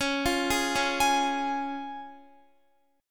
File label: Db Major Db Guitar; arpeggiated; x,16,15,13,13,15